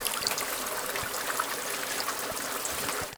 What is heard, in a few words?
frozen waterfall; Sound Design Tools; Raw Foley